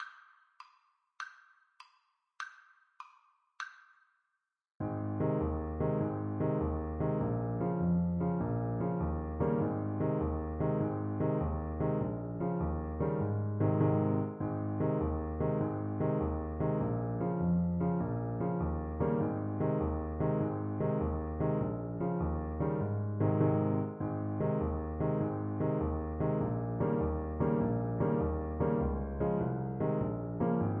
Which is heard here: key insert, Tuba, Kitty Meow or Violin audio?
Tuba